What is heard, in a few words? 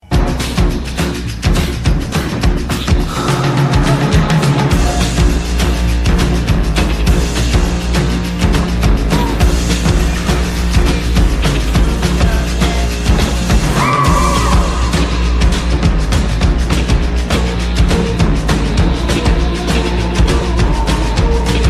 galaxy s5 unpacked ringtone